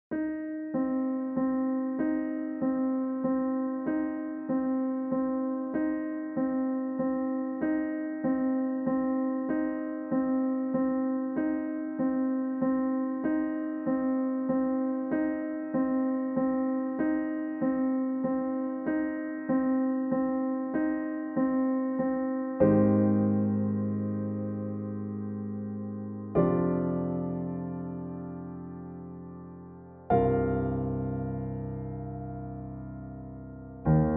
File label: Counting in Threes Pattern B Accompaniment